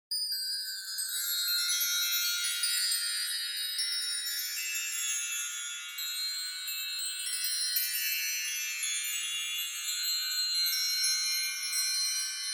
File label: Wind Chimes Reverb Long